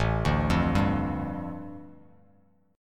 A 7th Suspended 4th Sharp 5th, A, down, Bass Standard; A7sus4#5 arpeggiated